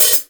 Real Drum Kit, JUNGLE KIT; Best Service ProSamples vol.43; Real Drum Kits

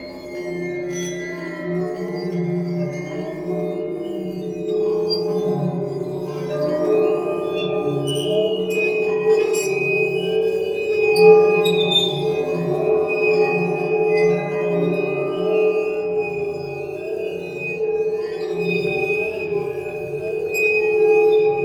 Waterphone Bow; Metal; Sonic Foundry (Sony Creative Software) - Ambient Atmospheres & Rhythms; Ambient-Metals

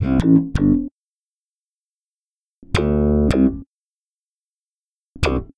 Electric Bass

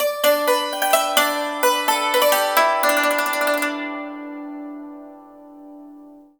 YANG CHING, Heart of Asia; Spectrasonics

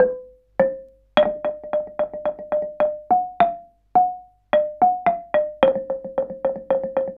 KULINTANG; Spectrasonics; Heart of Asia; KULNGTANG PH

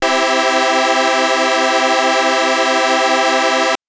Synth SyncPad maj c3; TrashLibrary

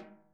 SNARE, Interactive Drum Kits

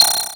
snr; Mono

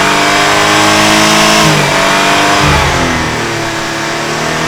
multi rev, Engines; E-MU Producer Series Vol. 3 – Hollywood Sound Effects; Ambient Sounds

